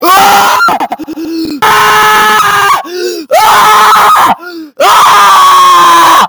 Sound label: loud scream funny